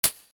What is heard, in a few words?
weird, sfx